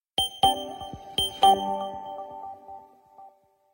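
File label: standard sms on nokia n96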